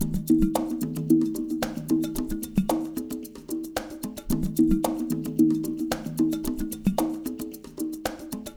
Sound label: AMB; Spectrasonics; Liquid Grooves 1 & 2